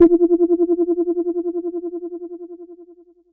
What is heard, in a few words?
Sci fi